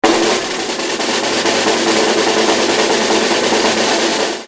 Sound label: drum roll